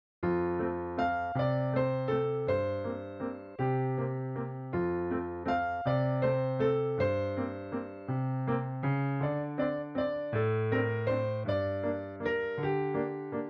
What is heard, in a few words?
no metronome